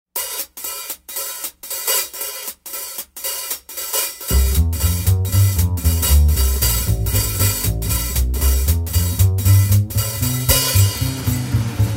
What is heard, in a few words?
voice